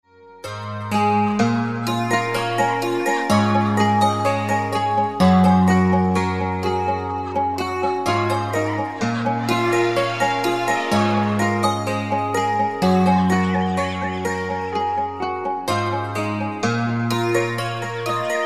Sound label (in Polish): muzyka bez oplat